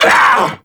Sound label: Vocals Pain; Warframe; Duviri; Dax